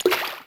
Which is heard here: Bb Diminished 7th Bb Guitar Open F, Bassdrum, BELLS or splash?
splash